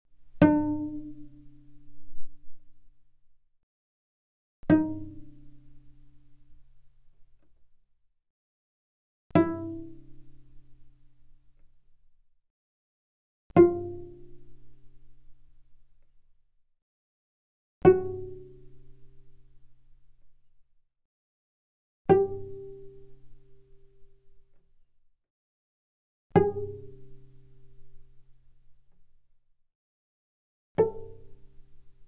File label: Cello pizz ff sul D D4 D5; mono; Strings